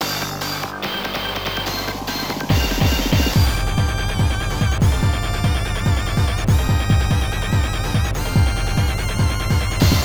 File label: Ending; From TV Animation Slam Dunk Super Slams; arcade